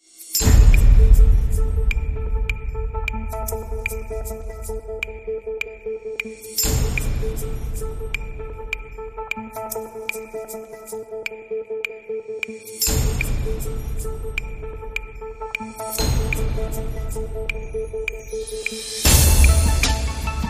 Percussion Synths Only; 30sec

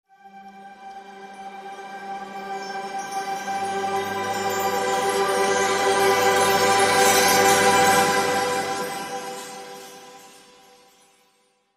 Chimes Cres

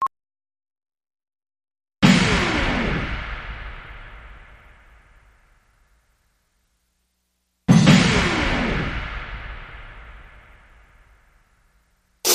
Perc; Perc SFX

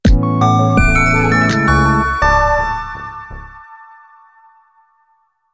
Bed and Bells Sting

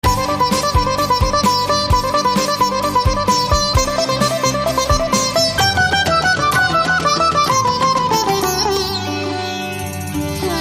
Punjabi Party

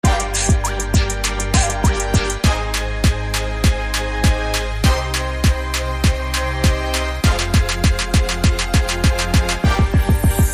Mystical Trap

